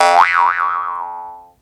JEWS HARP, cartoons

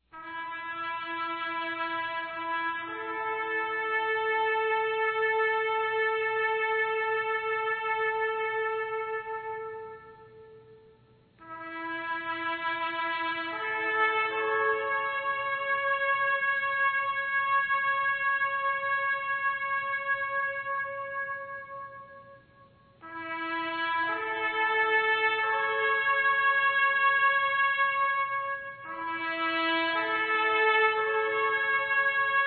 Bugle Call Taps; armi

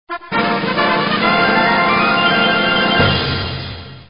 Winner

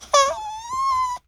CRY, baby

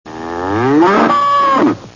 bovini cow bull; animali

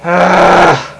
grunt; gemiti esclamazioni mugolii

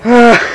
WHIMPER

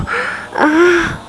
PLEASUR, gemiti esclamazioni mugolii; persone

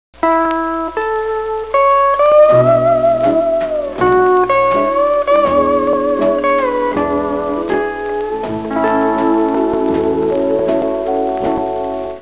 suonerie musica traditional usa country; american

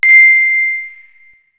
maiale pig; animali; maiali

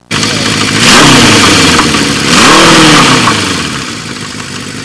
MOTORBIK; trasporti

